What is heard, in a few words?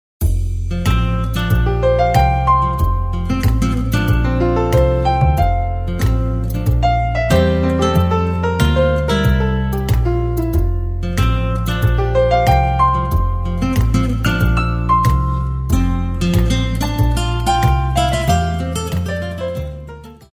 Light Contemporary